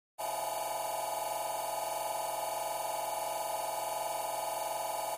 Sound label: Alarm Collision Osc